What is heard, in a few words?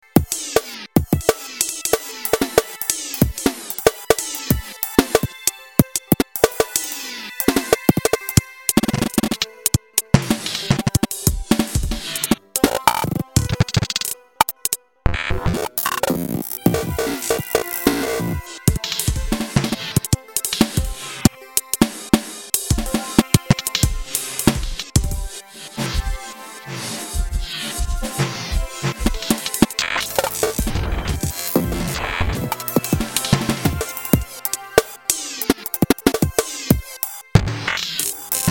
drum solo; william fields